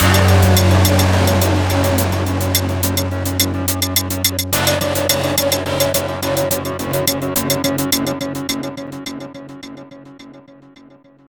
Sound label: Mission Music Stinger Intro; starcraft 2 wings of liberty